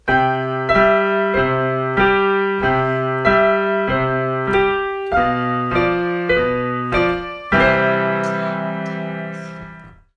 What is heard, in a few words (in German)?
Klavier Intermezzo; earsinning hoeren 2021; stadtschule michelstadt